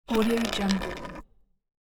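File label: pencil rolling long; domestic sounds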